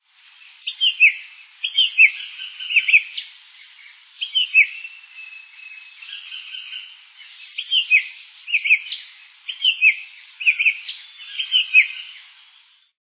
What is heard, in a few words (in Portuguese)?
tie-do-mato-grosso